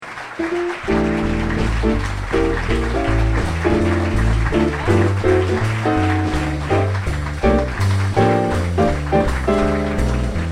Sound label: Closing Music